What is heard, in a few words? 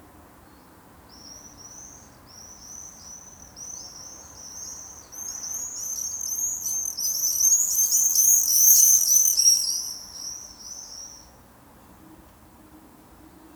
common swift call; Common swift (Jerzyk)